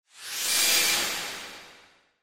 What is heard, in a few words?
Sci Fi Spaceship; Sci Fi Spaceship Pass By Fast Whoosh; Spacecrafts